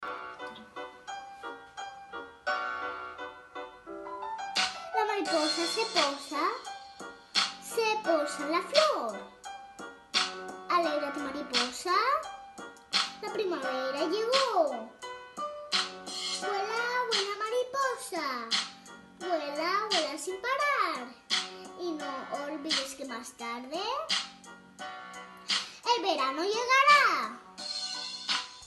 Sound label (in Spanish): rap